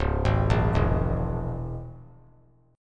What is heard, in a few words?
C sharp Minor 6th; arpeggiated; chord; 1,3,1,2; JGuitar